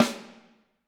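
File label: snare drum, Drums; Bob Clearmountain Drums I; SD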